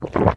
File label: toxic slime gurgle; video games; ambient; canals; source engine; portal2